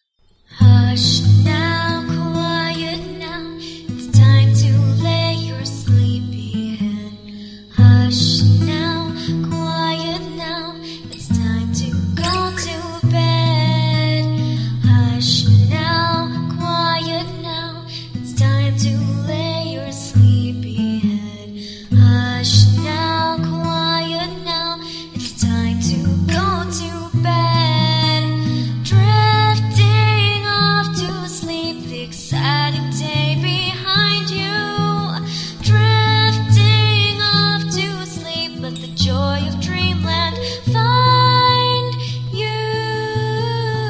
Acoustic Lullaby; Fluttershy

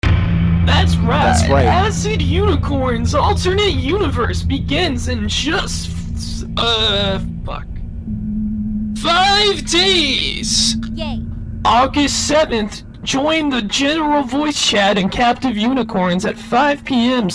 ACID ANTHEM

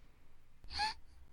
Moan

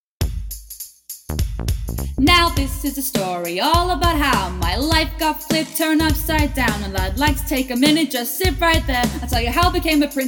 F, Cover; TV SONGS; Lol, I don't rap

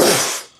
Gag; Lewd SFX

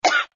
Cough; Lewd SFX

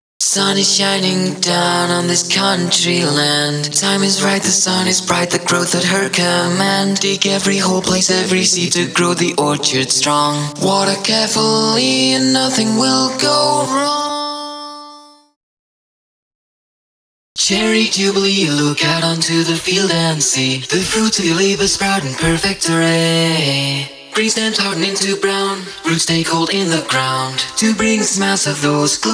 Acapella 140Bpm

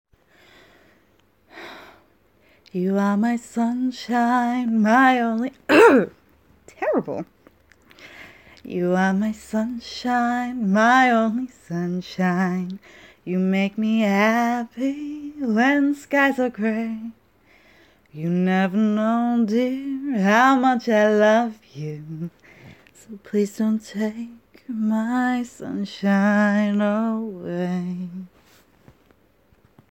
Sfw singing; Sunshine

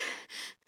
Breath; Lewd SFX

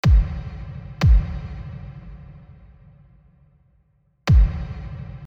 Kick Loop 92bpm

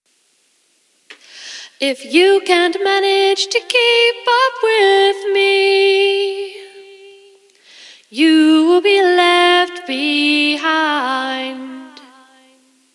vocals, 85BPM; Pony Fan Music Remix Stems

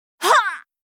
VOX Get Hit; Li Li; Heroes of the Storm; warcraft

